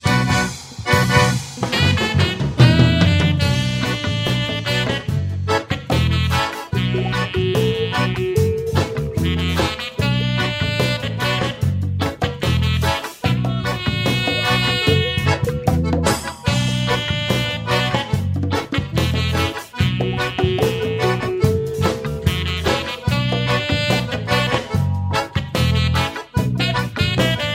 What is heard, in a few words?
reggae